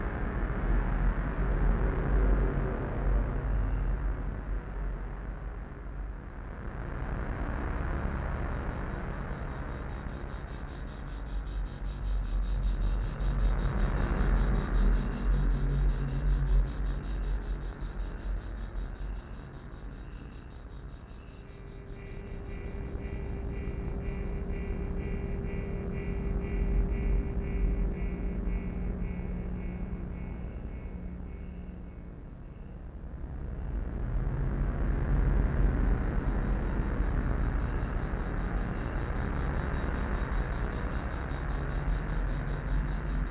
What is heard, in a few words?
drone